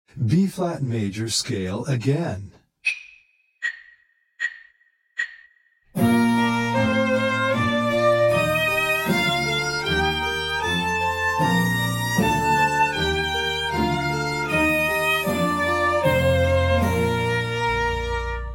Bb Major Scale Again

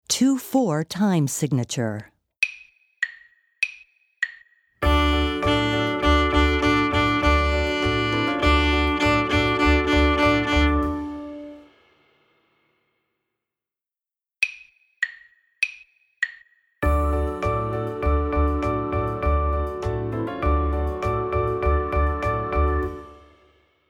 Two Four Time Sig

